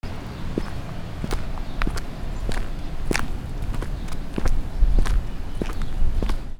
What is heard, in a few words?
FOOTFALL